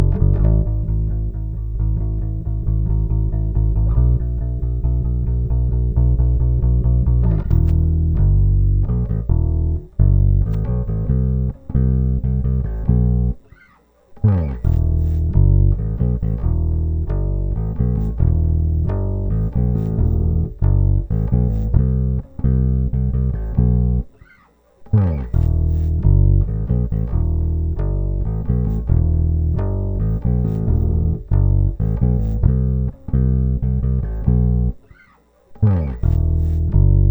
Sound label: bass